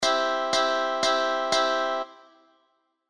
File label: guitar normal